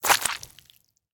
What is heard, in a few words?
Slime Splat; Deathrun